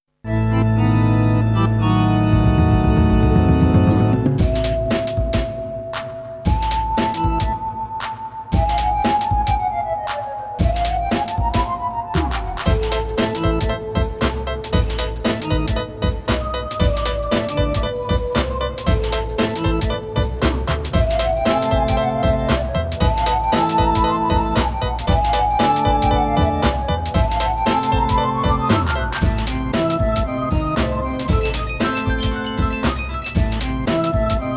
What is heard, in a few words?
Horror Boss; Pokémon Snap; Horror Boss (Unused)